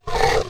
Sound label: chicken